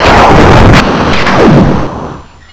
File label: bomber; WebGL; dandy